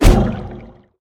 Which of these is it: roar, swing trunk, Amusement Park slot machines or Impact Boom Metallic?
swing trunk